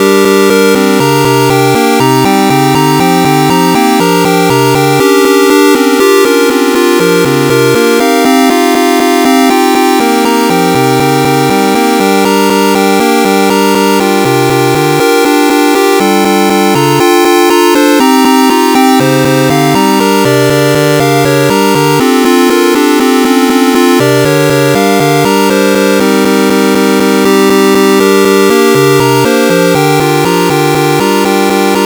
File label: square minor blues; Chiptune Minor Blues; Minor Blues Scale; music generation library in Rust